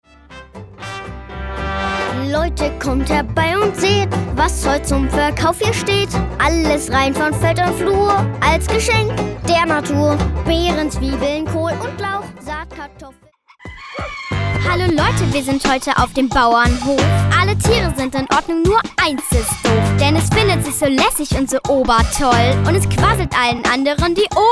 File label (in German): Musical